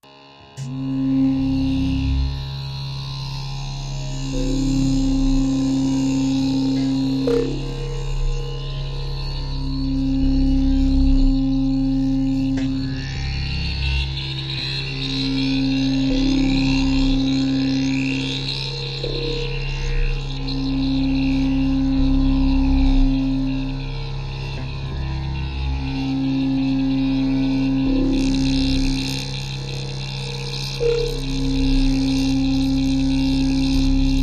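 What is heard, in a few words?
Electro Static, energy beams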